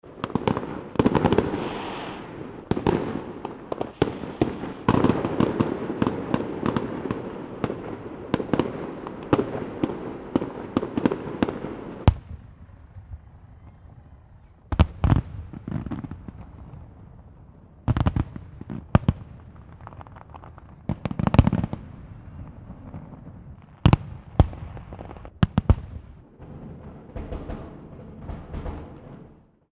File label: Ambisonic Fireworks